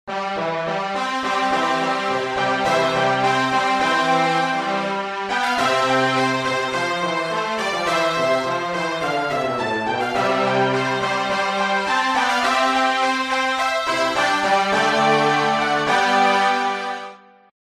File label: orchestral brass ensemble